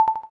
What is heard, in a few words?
Xylophone